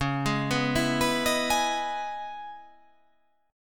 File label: C sharp Minor 9th C sharp, chord